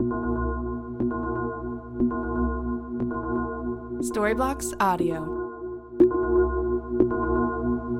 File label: Ambient House Arp Pad 120BPM C